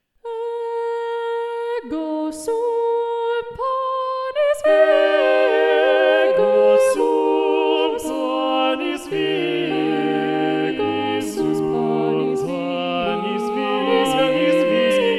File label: Ego sum panis vivus SATB Balanced Voices; William Byrd